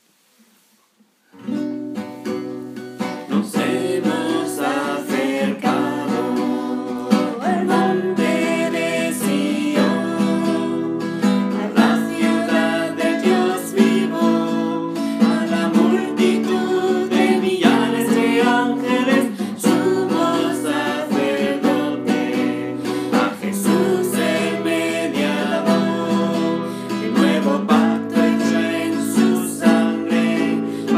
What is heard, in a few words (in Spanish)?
guitarra